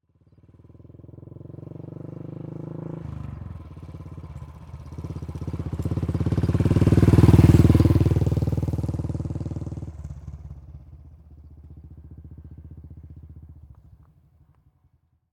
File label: Royal Enfield, Bullet, Pass By, Slow, Royal Enfield Classic 350; Bombay Sonic